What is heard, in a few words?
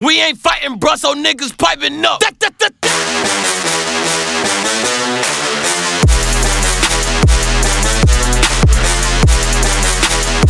drums